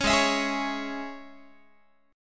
G sharp Suspended 4th Sharp 5th G sharp, Guitar; strummed

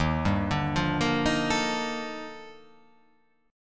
D sharp Major Sharp 11th D sharp, chord

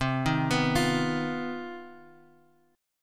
C Minor Major 7th Double Flat 5th, chord; CmM7bb5; {X 3 1 X 0 1} arpeggiated

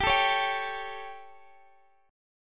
G Suspended 2nd Flat 5th, Guitar Standard; strummed; x,x,17,14,14,15

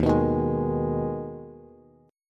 Eb Suspended 4th Sharp 5th Eb Bass; Ebsus4#5 strummed; JGuitar

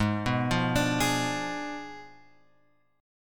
G sharp Diminished 7th G sharp; G#dim7 Chord {4 2 3 X 3 4} arpeggiated

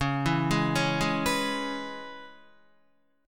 Db Minor 7th, Guitar; arpeggiated; JGuitar

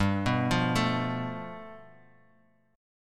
G 9th, Bass Standard; arpeggiated

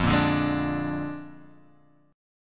Bb 7th F, chord

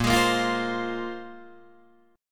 Bb 7th Suspended 2nd Bb, Guitar Open D

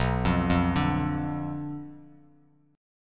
B Diminished B down Bass Standard; Bdim arpeggiated

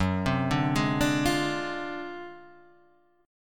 D 7th Sharp 9th Flat 5th F sharp, chord

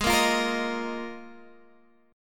Bb 7th Suspended 4th Ab

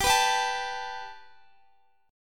G Major Add 9th Guitar, chord; Gadd9; strummed; x,x,17,16,19,16

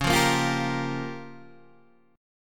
C sharp 6th Flat 5th C sharp down Guitar DADGAD

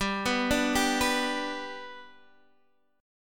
G Major down Guitar Half Step Down

